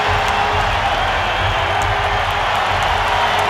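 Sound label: free spins intro loop; netent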